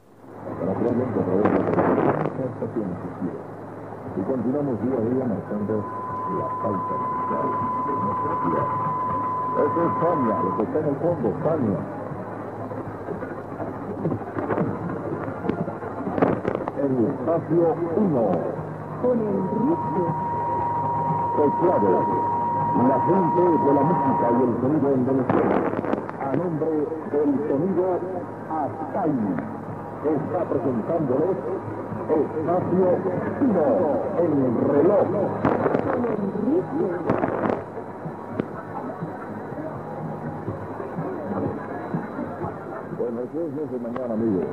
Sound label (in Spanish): reloj, Maracaibo, 1300 khz